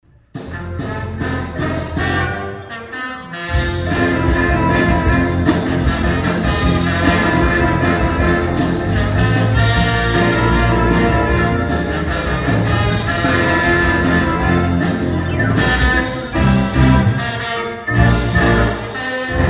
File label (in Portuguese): MÚSICA INSTRUMENTAL